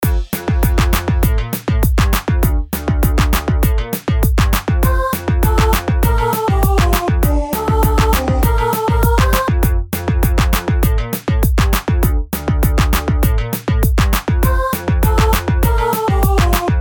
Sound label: fun guitar